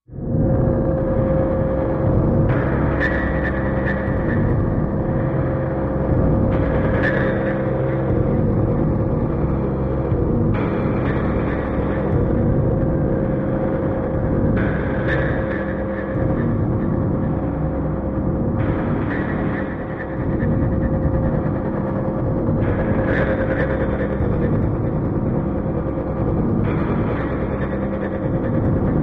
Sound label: Motor Monitor, Machine, Low Factory Ambience, Echoes, Vibration